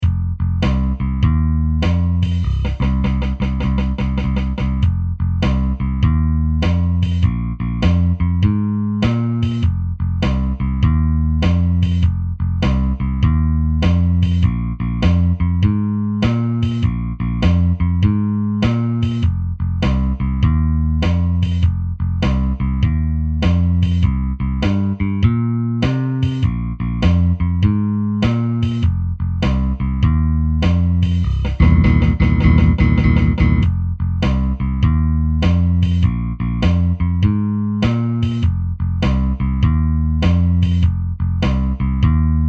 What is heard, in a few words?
style, piano